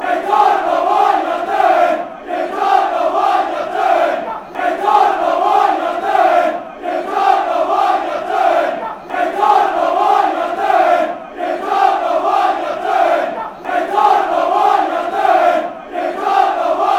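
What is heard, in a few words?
fanchants, football chants; dont know what yer doing kili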